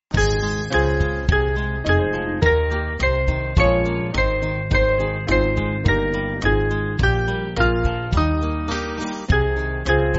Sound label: Band, church music; Oriel